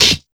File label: SD, Drum & Bass, 160 BPM